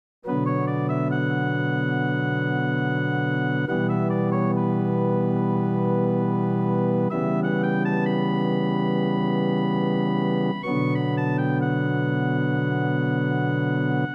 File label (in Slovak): organ, piano; V zámku Perinbaby je organová skladba skomponovaná Petrom Hapkom pre rozprávku Perinbaba